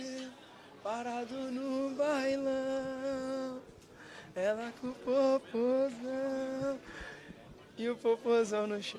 cantando; neymar

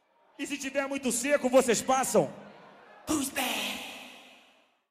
ao vivo na roda de funk

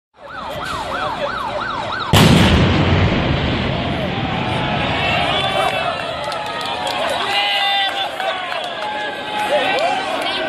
explosão

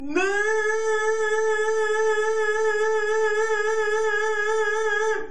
exercicio vocal 10 oitavas

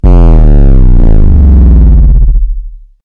energia caindo

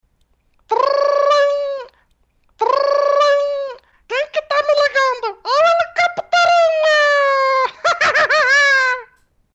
helicopterinhoo

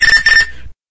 efeito toque do celular

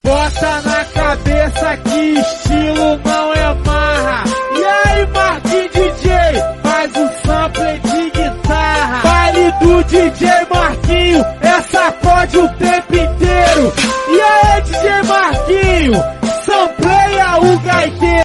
guitarra; pou

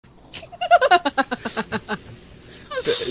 risada do mau

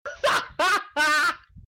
risada

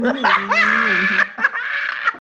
risadaaaa

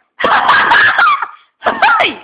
risada

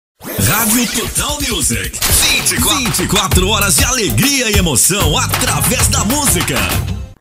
pacote de vinhetas para radio

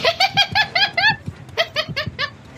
risada malefica

